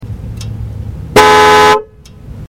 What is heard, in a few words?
523i Horn Outside